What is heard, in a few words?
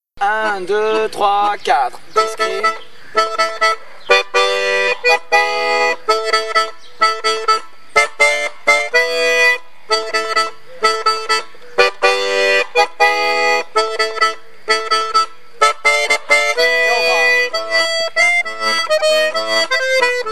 - pont accords; Enregistrements St Maurin; rencontres-2014